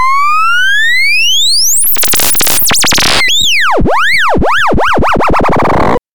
tuning

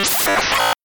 whoosh; Whooshes and sweeps

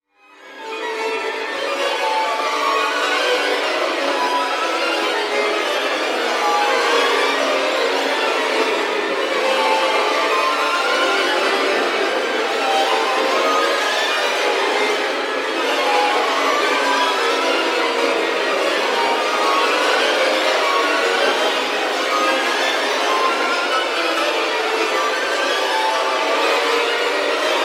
Music bed - Multiple strings for horror effect; Hollywood Edge Sound Designers Tool Kit

